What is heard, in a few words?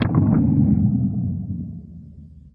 power decrease